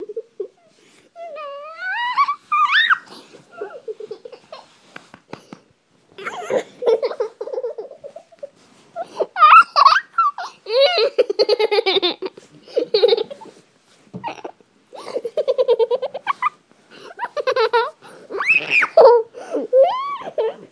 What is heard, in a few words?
laughter squeal